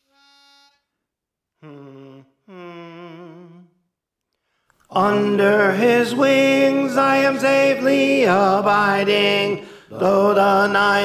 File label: Tenor; Under His Wings; Praise For The Lord Training